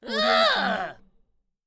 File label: goblin surprised